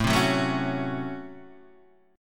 Db Major 7th Suspended 4th Sharp 5th A

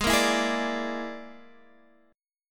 Bb 7th Flat 5th Ab

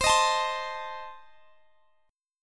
A Diminished C

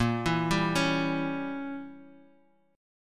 A sharp Diminished 7th; 18,19,17,18,x,x,x,x

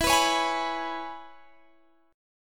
E 6th, down, Guitar, Standard; strummed; JGuitar

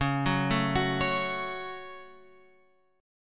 C sharp Suspended 4th Sharp 5th; C#sus4#5; {9 9 7 X 7 9} arpeggiated

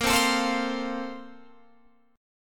Bb-Major 7th Suspended 2nd-Bb-down-Guitar-Open F; BbM7sus2 strummed; JGuitar

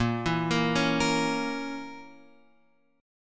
Bb Diminished Bb Guitar Open F; arpeggiated; JGuitar

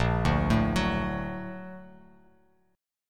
A sharp Augmented Major 7th A sharp Bass Standard; A#+M7 arpeggiated; JGuitar

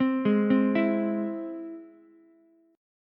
E Augmented, Ukulele; E+ arpeggiated; JGuitar

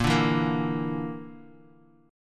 A sharp Major Flat 5th, Bass Standard; strummed

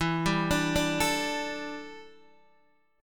E Minor 11th guitar chord, DADGAD

